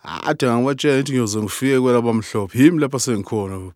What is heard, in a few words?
Vocal Chirp, African Voices